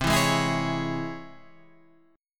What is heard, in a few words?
C sharp Minor Major 7th, chord